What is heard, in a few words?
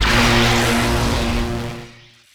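Laser Fire Interior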